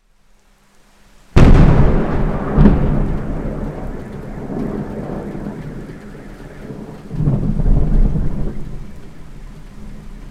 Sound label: lightning sergiyev posad